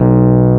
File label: EPF B 0; AKAI S-Series CD-ROM Sound Library VOL-2